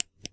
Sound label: Torch Click